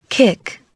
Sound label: kick